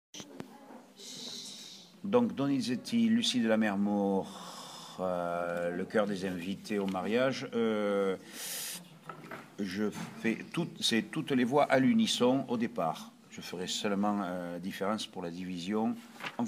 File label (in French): tenor